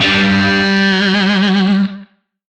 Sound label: Shawzin G; Warframe; Lizzie Shawzin